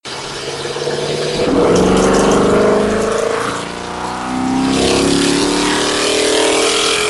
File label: x350 knocking